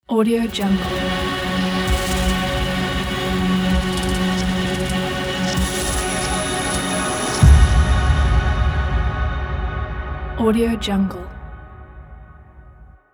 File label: glitch logo revealer